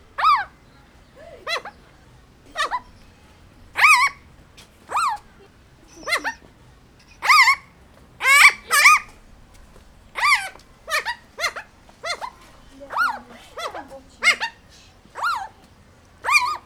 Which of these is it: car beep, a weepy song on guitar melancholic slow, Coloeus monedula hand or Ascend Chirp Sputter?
Coloeus monedula hand